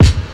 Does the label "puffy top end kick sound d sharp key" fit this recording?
Yes